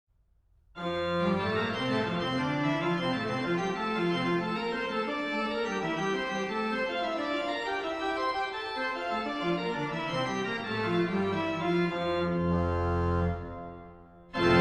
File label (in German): orgelwerke; j s bach